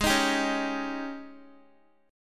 Ab Major 7th Suspended 4th Ab Guitar 4E,4A,5D,5G,5C,6F; strummed; x,11,13,12,13,x; down